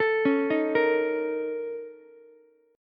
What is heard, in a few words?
Bb Minor Major 7th Double Flat 5th, Ukulele; arpeggiated; chord shape; 14,13,11,13